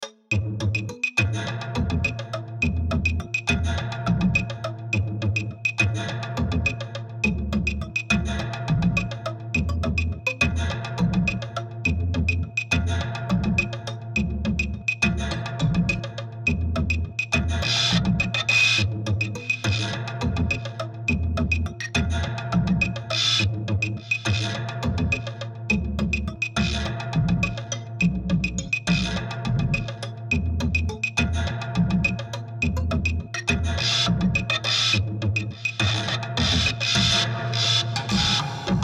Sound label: drums